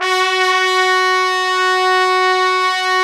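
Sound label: BRS TPTS, Trumpets Section 4 f; Roland L-CDX-03 Disk 2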